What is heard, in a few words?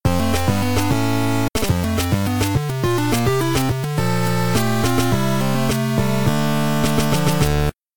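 win, background music; ragemap2024; giegue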